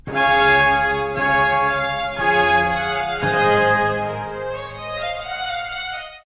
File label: Telemann Trumpet